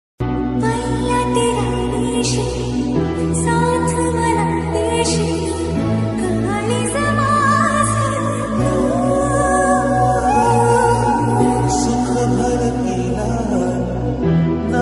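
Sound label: marathi ringtone, BGM ringtone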